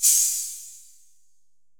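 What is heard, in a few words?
808 open hi-hat; 480L; Wizoo; Platinum 24 Electronic; 480L MP 808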